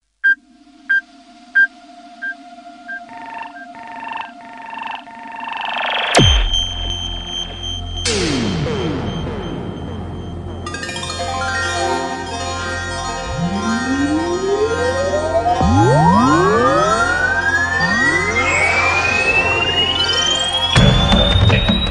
Alien Ringtone

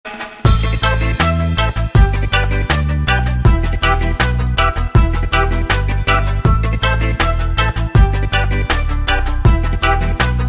Chilling Reggae

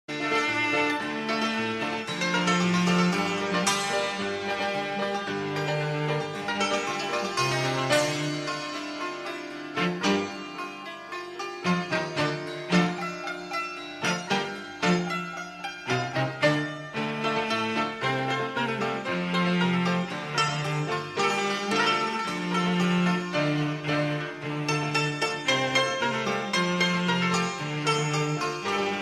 turkish music; ringtones

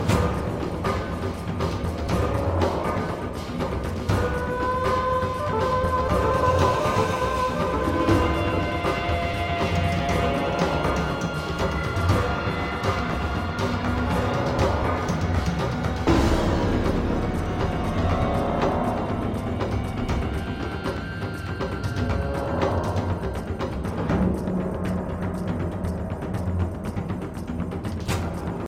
Combat Loop

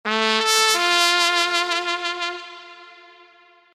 Trumpet SMS

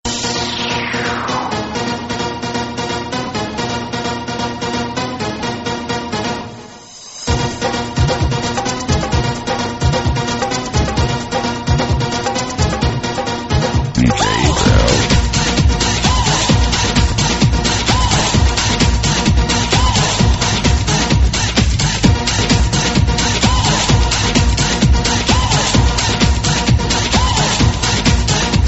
bhangra mix; alternative

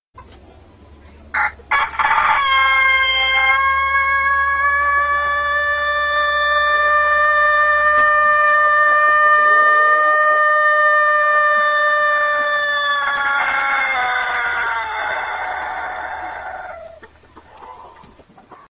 Annoying Rooster

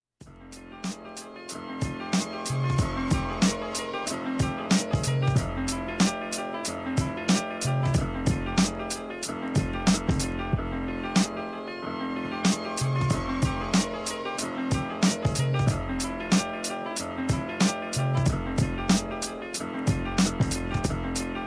instrumental

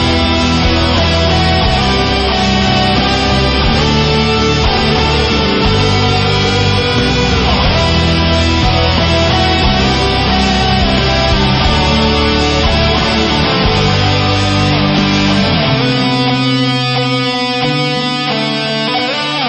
Guitar

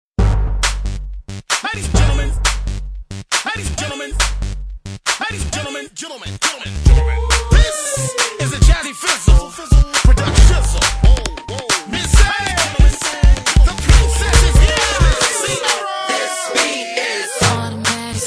Remix

